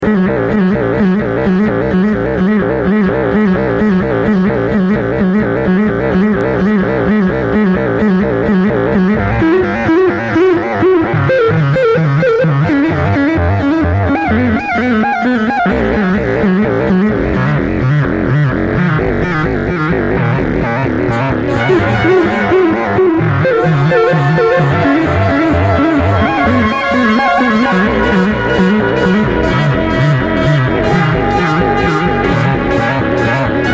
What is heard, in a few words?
psionik trance